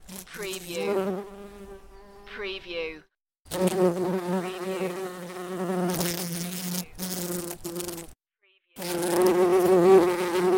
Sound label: Bumble Bee Buzzing